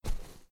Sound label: walking dirt